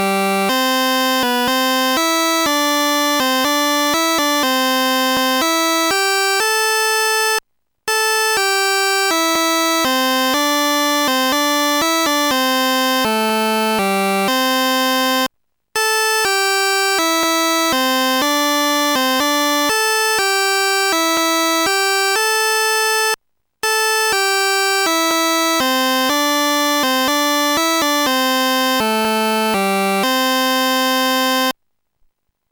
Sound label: auld lang syne melodie; Sonix Music Driver